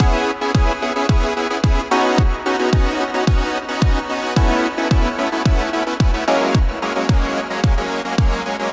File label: gated pad, I layered some different sustained synths and then applied a rhythmic volume automation to it; Rhythm Quest